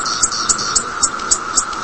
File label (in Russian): cikada shumnii zvonkii na ulice